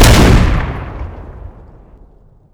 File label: shortgun shoot; tf2classic